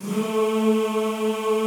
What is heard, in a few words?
A2 L, Classical Choir; Best Service; Extended Classical Choir